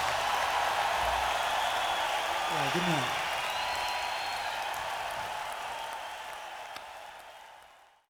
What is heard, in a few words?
Tuning